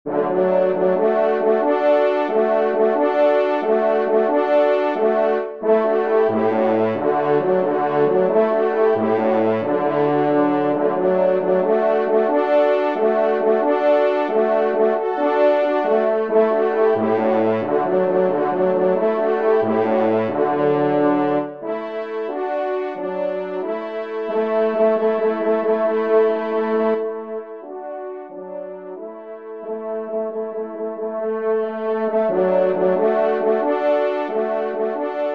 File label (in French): trompe; Compiegne